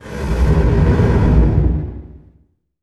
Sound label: IMP FLAME, Cinematic Impact